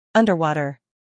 underwater

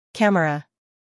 camera

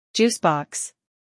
juice box

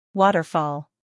waterfall

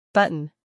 button

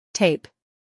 tape

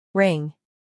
ring

